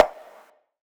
Beatbox Perc, Hybrid Beatbox Drums; Antidote Advent

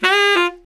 SAX LICK, BRASS LICKS; Ueberschall; Jam Box

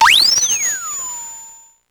Drum, Electro-Harmonix Spacedrum

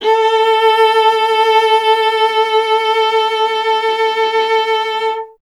viola A; Miroslav Vitous; Solo Instruments VOL-1

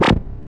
Noise Hit

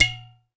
Udu Pot Slap, percussion; Roland BK-5

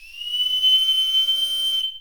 DEPARTUR, Anime Sound FX; COMIC

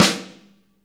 SNR JAZZY, Snares; Roland - Rhythm Section; Snares 4; Sn Modules 4

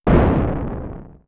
Bang!, synth fx; StarWars; X-Static Goldmine

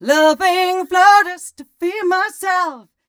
f mel e flat, fem Vocals

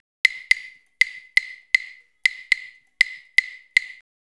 LOOP, Percussion

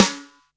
Boss DR-660 Snare, Drum Machines

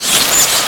Galloping; SNES; Shin Chou Kouki

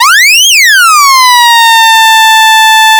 MEMORYMOOG

